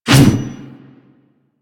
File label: Mace Whoosh; Warframe